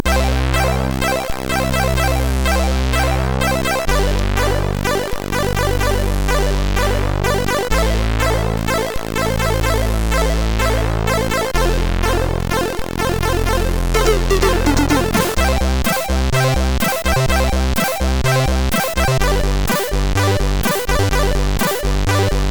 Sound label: Jingle, sid MOS6581R3; HVSC